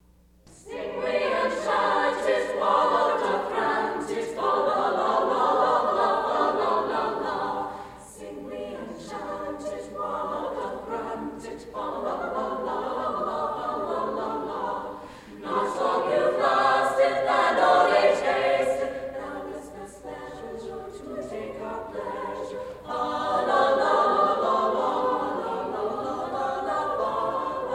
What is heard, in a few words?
chorus; Morley